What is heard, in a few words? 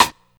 good acoustic snare b key, snare drum tuned to the B note